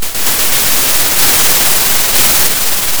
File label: pop, Sound of a thunderstorm